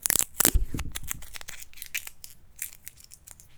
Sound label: Peel Sticker; Micro Pack; CaptSubtle